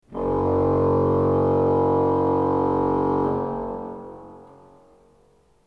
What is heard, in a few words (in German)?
ton, orgel